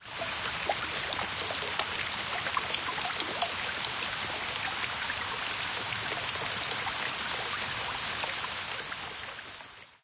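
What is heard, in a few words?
ambience Rain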